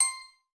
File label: counter